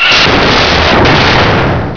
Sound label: door; duke